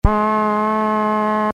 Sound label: Munchkin choir original sample; Sampling sound